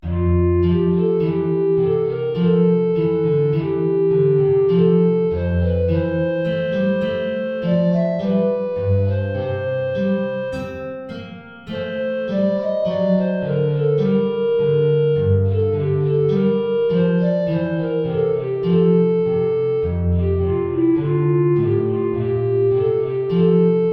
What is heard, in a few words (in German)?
Tenorflöte Gitarre; Warum sollt ich mich denn grämen